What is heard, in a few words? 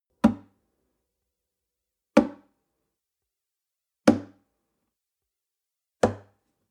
nylon guitar drum single high